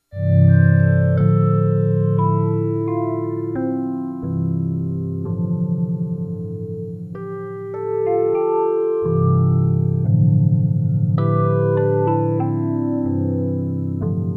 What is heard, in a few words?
xfade, multiphase; Scott Stites